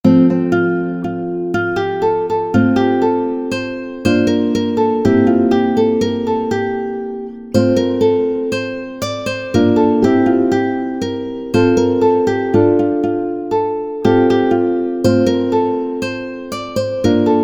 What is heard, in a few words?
guitar; Buergerlied